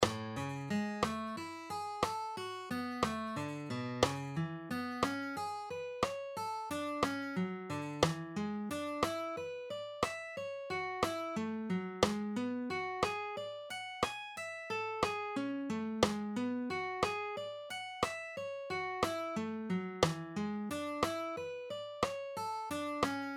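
String Skipping Exercises Half Speed, Arpeggios in key of A Major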